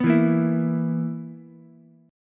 B Major 7th Suspended 4th B down Ukulele; BM7sus4; strummed

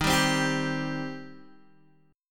Eb 6th Eb down Guitar Open G

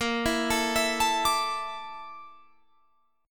A sharp Major 7th Flat 5th A sharp Guitar Drop D

